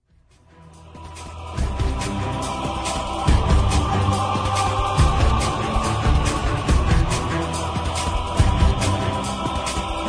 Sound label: instrumental version